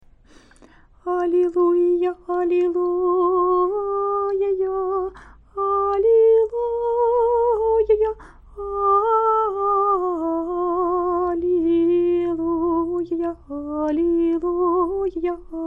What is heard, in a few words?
halleluja, singing